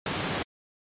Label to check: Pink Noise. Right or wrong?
Right